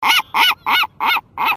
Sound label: seagulls